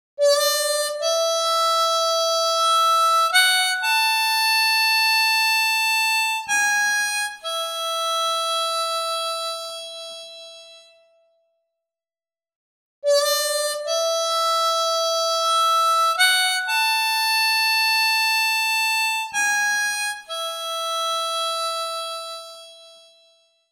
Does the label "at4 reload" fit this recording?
No